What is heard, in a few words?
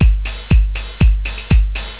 drum